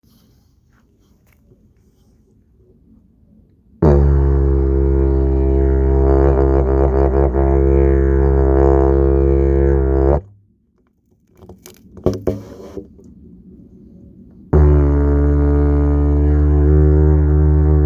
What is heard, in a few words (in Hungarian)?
Slide, didgeridoo slide teleszkopos